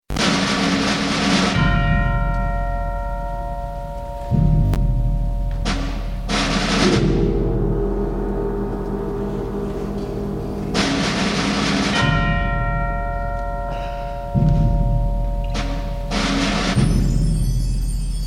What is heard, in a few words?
Taps; 4th of July 2015